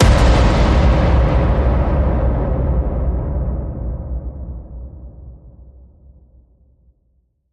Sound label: Star Killer Slam